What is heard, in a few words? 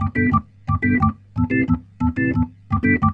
Roots Reggae, B-3; Sounds Good - Roots Reggae